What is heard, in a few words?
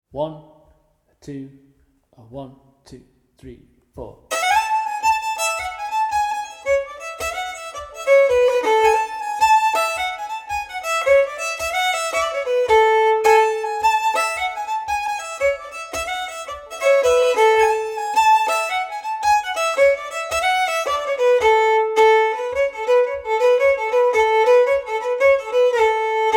Fiddle Full Speed; Grimstock